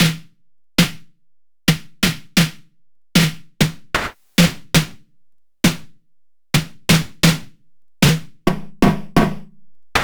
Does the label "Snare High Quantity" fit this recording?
Yes